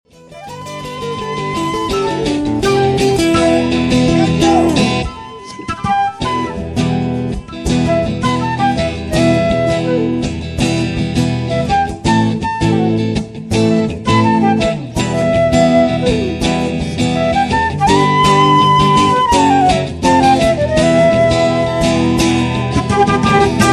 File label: jazz